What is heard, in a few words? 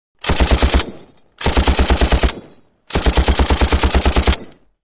M60 Automatic Multip